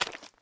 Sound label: flesh